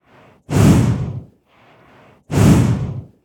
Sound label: sniff, horses; CosmicRage